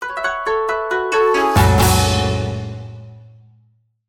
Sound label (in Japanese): 和風よさこいアイキャッチ